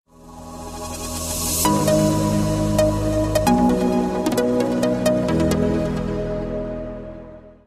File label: techno sms ringtone